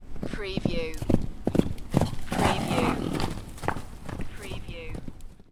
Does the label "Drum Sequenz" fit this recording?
No